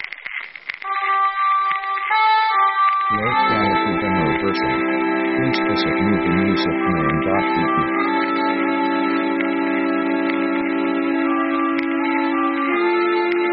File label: jazz old fashioned